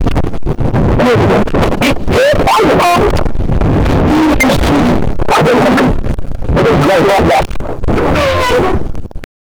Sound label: make a velociraptor roar, dinosaurs